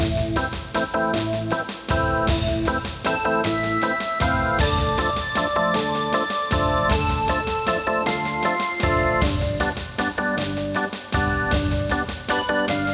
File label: hurdy gurdy